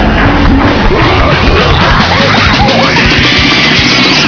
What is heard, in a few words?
insane